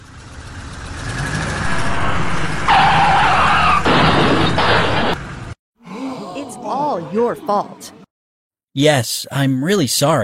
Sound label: traffic